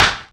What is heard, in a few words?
raw urban snare drum b key; snare drum samples